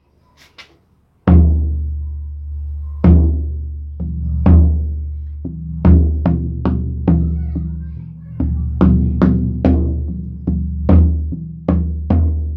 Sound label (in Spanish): Caja bombo criollo